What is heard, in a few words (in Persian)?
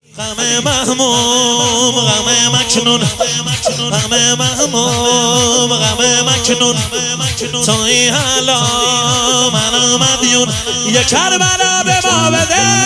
مداحی